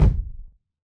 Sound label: dog day stomp; dognap